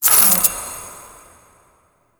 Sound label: Boon Reroll, UI; Duviri